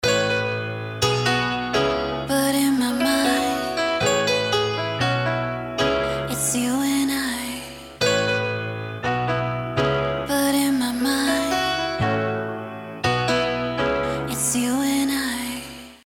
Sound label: Neutron 4 Trash Overdrive